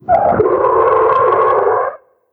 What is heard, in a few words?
damage; Barotrauma; Tigerthresher